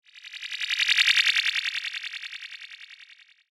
Hover Robot Pass By, Scifi; Robots; BlastWave

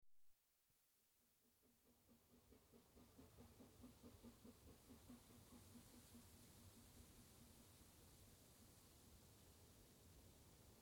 Steam Train coasts past right to left; Trains (Sound Effects:Vehicles); BBC

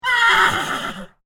Horse Neigh, Horse whinney; Horse Sound Effects

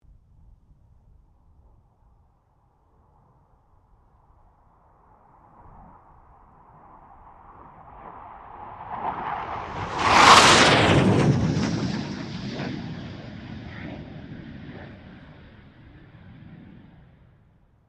FA-18 Hornet pass; RAAF FA-18 Hornet; Airplanes

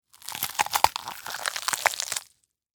Horror Carcass Flesh Rip Tear; Injury Sound Effects; Injuries (Sound Effects:Combat)

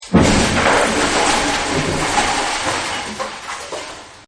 Crash; Crashes; Objects; Partners In Rhyme

